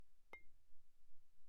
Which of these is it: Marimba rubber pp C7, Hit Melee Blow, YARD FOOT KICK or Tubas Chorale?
Marimba rubber pp C7